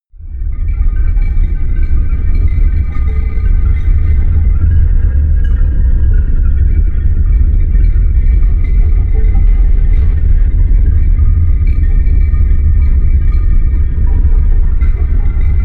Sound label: bell rumble